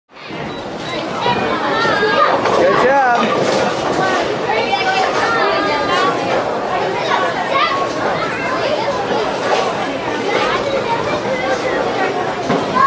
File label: bowling, bowling alley